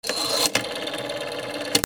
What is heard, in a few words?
PHONES